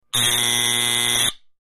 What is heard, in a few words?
Solenoid Buzzy Rapi, buzz